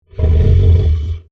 Monster Snarl, Horror, Monster, Snarl, Large, Creature